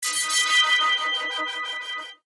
magical; maoudamashii

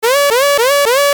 retro; maoudamashii

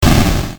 retro; maoudamashii

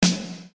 instruments drum snare; maoudamashii

